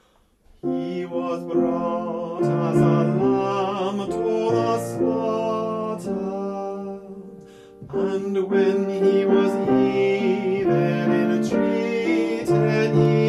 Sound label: soprano